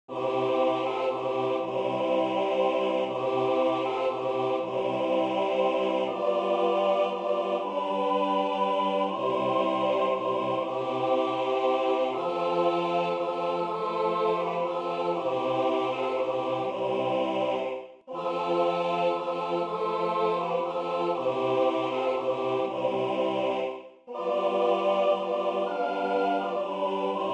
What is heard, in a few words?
soprano; Silent Night